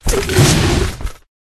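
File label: Rat attack mid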